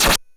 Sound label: Drums, Hits; DCM8